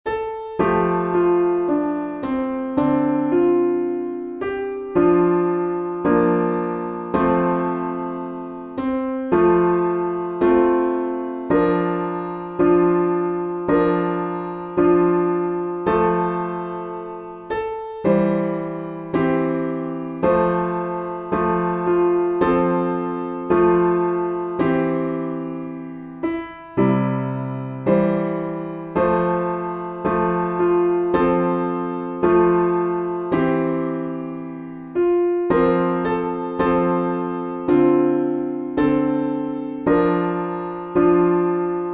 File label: piano backing; tuba; I Gave My Love a Cherry